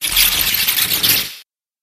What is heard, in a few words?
monster vampire bat